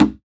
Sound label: Percussion